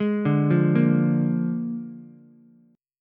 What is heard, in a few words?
Bb 7th Bb down Ukulele; arpeggiated; JGuitar